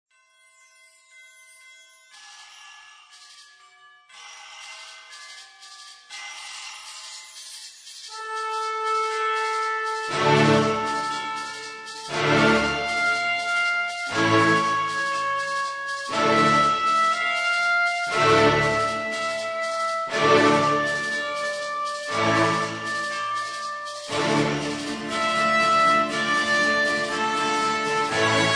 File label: Rattle snake; Film TV Games Album by Rhett Buckley